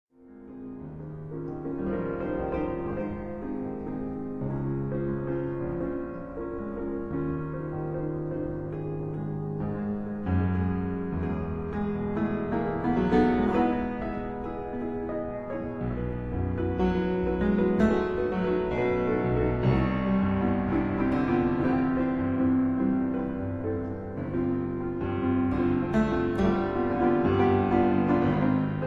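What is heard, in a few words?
études for piano in Ab major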